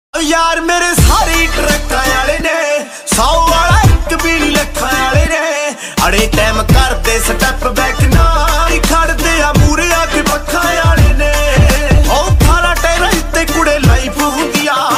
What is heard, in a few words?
Dance Punjabi Ringtone